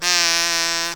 Kazoo so

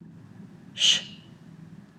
Shh

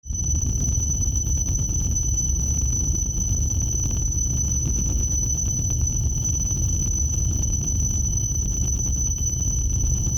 Eerie Pulsating Rumble And Tone Texture

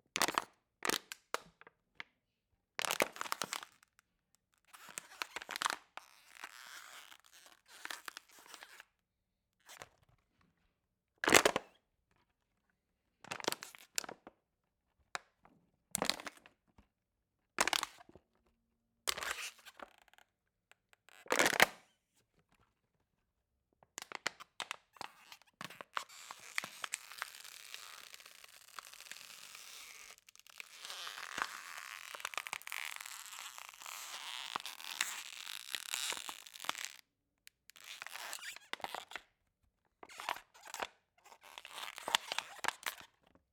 Bottle Crushing A Plastic Water Bottle

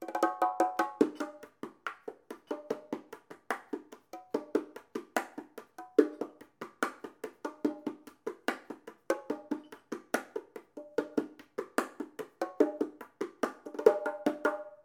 Bongo pattern 145bpm

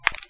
Knuckles Crack

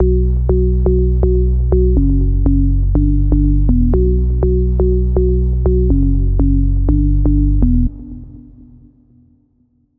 Cliche House Chords Bassline

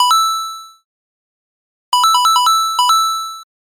Coin

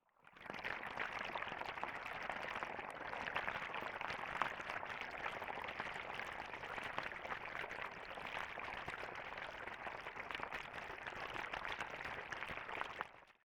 Underwater

